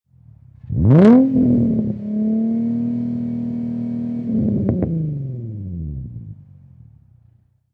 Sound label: Infinity G35 Exhaust Long Rev, High Performance Car; Auto, Power Car; Infinity G35. Exhaust perspective. Revving, Long rev